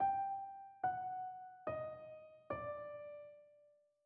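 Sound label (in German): Tetrachord Span1 g ab, spanisch; Tetrachorde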